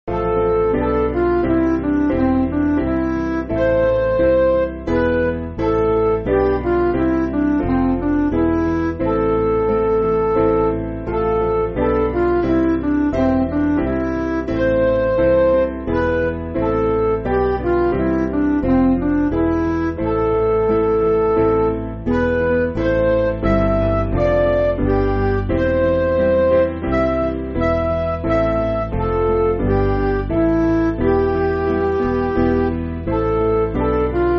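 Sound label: Midi, Music Backing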